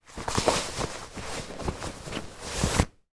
foley shorts pocket hand in movement remove; sound effects one

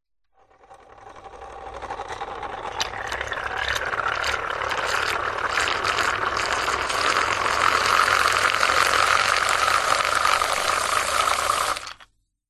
household milk frother on run off short milk spin; nespresso; zapsplat

